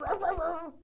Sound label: dog bark; Animals